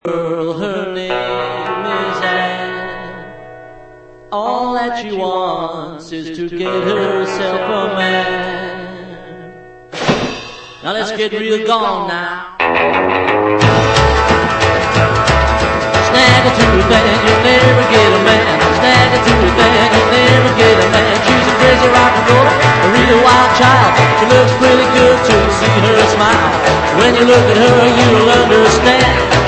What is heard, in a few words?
surf